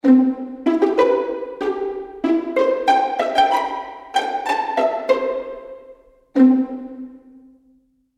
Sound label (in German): Pizzicato Violinen; Wallfisch